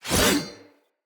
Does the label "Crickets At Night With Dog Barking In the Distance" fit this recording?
No